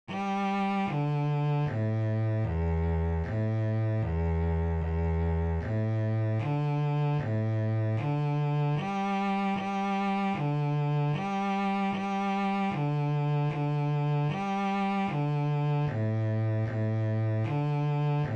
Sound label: Half Notes Open Strings, Bass; Bass for Camp